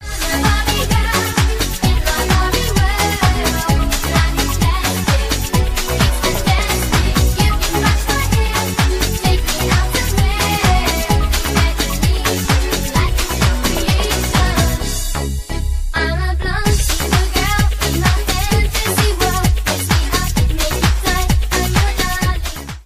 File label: Trap Mix, tono de llamada